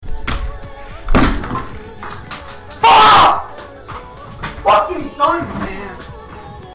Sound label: fail sound; JesusOmega {NM} Planets with other shit